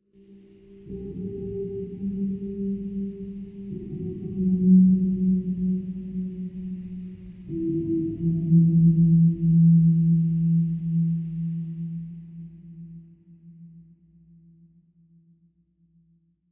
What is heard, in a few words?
Rain